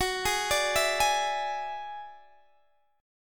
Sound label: Gb 7th Suspended 2nd Sharp 5th, Guitar, Open D